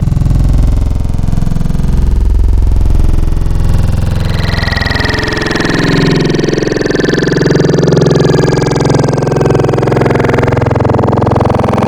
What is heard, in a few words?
Darkest Growl; Cymatics